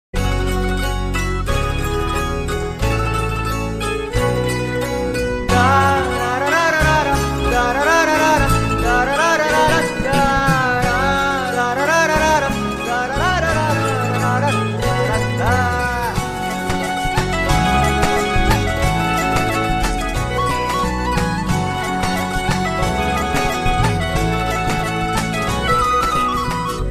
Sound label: agnyaathavaasi love ringtone intro bgm ringtone; suryakantham